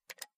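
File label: Low pitched Mouse Single, mouse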